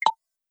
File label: close; Nikolai Papin